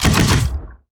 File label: tower tricannon basic Attack